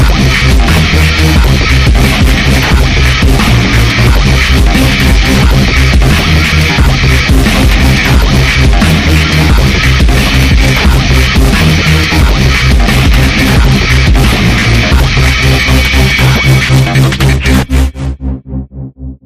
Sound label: Modern Digital Dubstep Mix; bbc